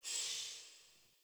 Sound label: UI Progression Category Fade In